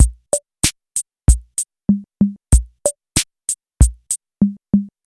95 bpm fresh drum loop sample A sharp key, kick tuned to the A# note